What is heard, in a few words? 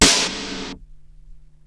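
snare processed, Disco House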